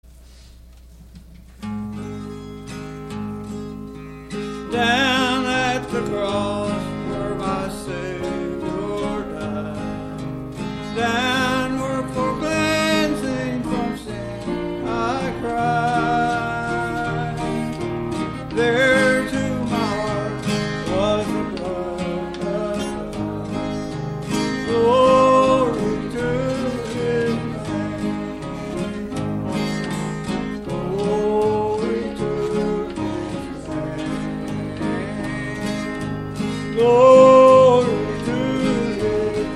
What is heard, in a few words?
Choir; Glory To His Name